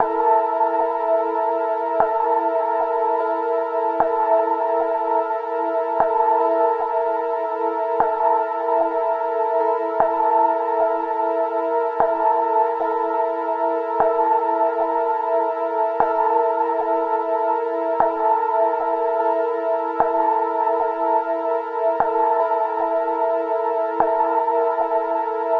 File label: Synth Loop 150BPM; groove techno